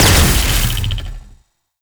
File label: Wave Ability Proj By Explosion; Enemies; Abilities; Warframe; Duviri; Dax Knight